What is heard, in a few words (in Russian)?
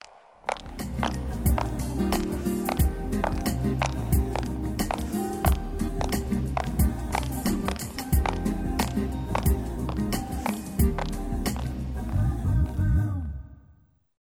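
шаги высокие каблуки торговый центр; Шаги, высокие каблуки, красивая мода, торговый центр, прогулки, кино и телевидение звуковые эффекты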